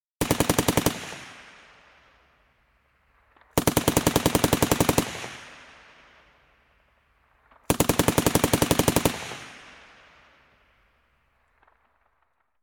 General purpose machine gun, surface fire from close left; BBC